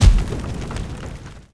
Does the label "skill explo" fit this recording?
Yes